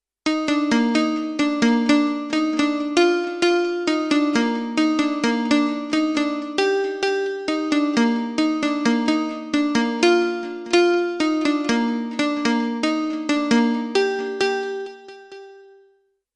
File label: FM guitar